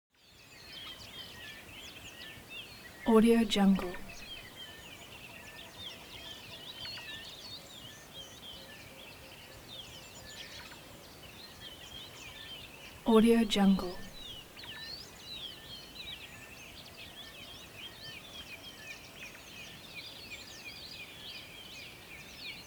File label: bush water hole early morning; atmo; smartsoundfx